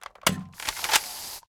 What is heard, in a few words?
Snow Gun Shoot; Phasmophobia